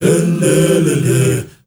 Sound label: MALE HUM; Spectrasonics; Heart of Africa